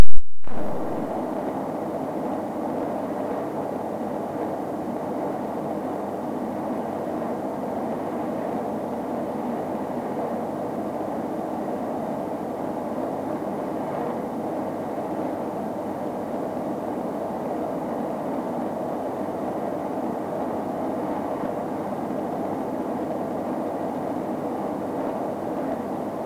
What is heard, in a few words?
recording with noise reduction room with powerline intercom; le chateau; 2 powerline intercom with 1 on airco